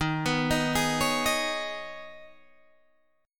D sharp 7th Suspended 4th Sharp 5th, Guitar, Open G